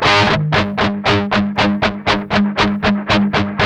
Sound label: 8TH A, Guitars; Spectrasonics; Hans Zimmer Guitars VOL-1; 128-JACKHAMR